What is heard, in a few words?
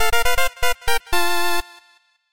Jingle Develop Levelup; Dandy Dungeon Legend of Brave Yamada